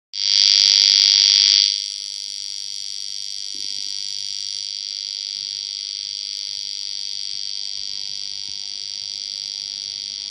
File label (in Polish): buzzer bez generatora piezo